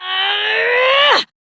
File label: Abil Exert Adult, en; Warframe; Duviri; Drifter; Melee